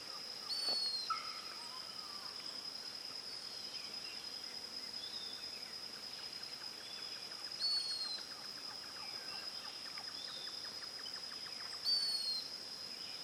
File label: Pied puffbird